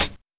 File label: mellow pedal hi hat sample B key, hi-hat sound tuned to the B note; hat samples